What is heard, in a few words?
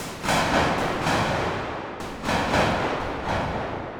Rhythmic (120BPM); musicradar